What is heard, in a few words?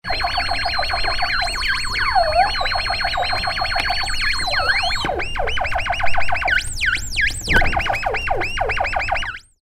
Electronic Insects